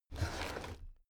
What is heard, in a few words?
drawer cabinet crammed open regular halfway